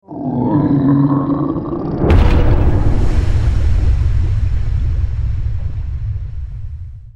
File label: death; urmuk; Might and Mayhem